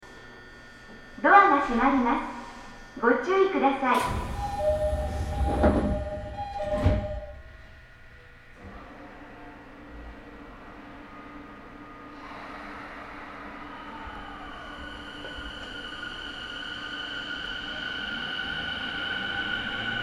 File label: twr 70-000